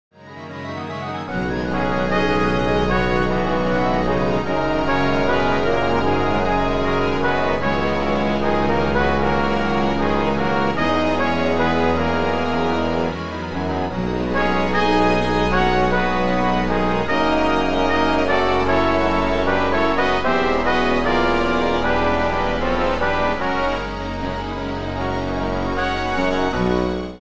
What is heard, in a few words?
MIDI Brass excerpt